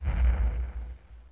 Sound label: fire